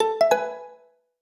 Doodle, notifications; Amazon Fire Tablet 5 Gen

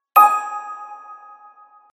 ding, notifications; HTC EVO 4G LTE

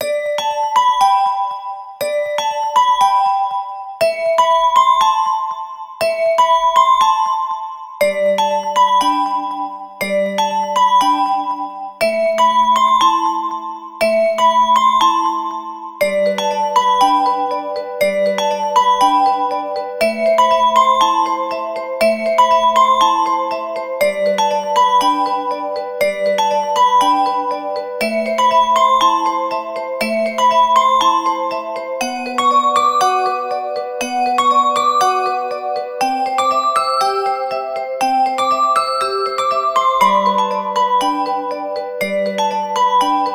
Alarm; PlusFourSix; Venus; Lars Edvall; X1 Family 2

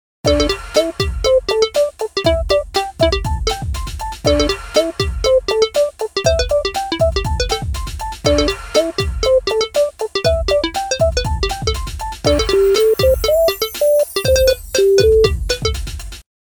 SYNTH

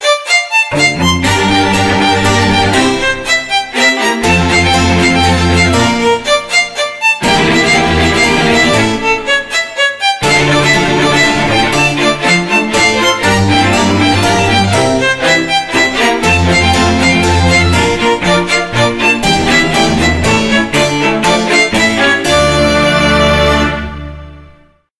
Melody Lake, ringtone; SKY IM-A870S VEGA Iron

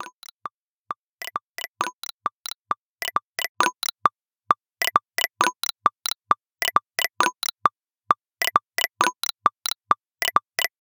Gallop, alarms; Google Pixel

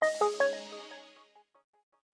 Power Off, phone tone

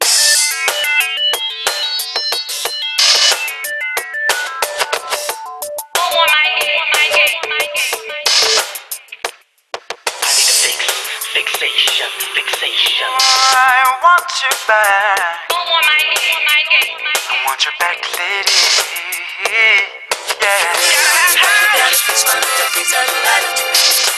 Deep Bass; Karma-QA1

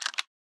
Lock; SKY IM-T100K VEGA No.5

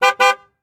honk honk; Google; Google Sounds; Play It Loud

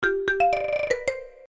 Brisk, notifications; Oppo Find X903

